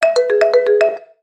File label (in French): marimba court; sonneries